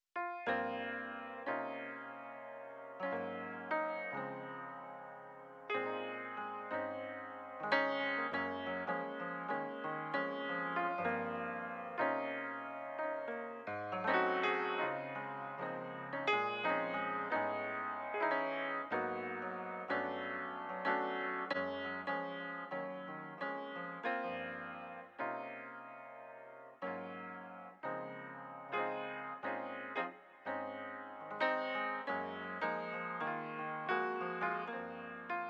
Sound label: Residual Piano Recording